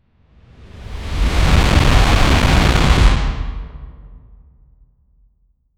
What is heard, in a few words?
dramatic whoosh